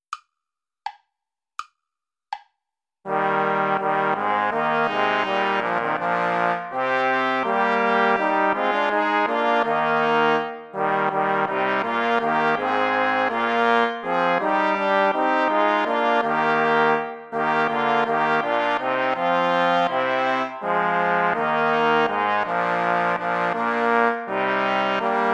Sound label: play along without trumpet; praetorius michael herzlich tut mich erfreuen